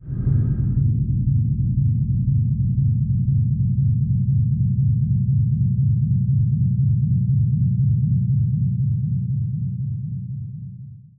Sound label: pit layer; underlord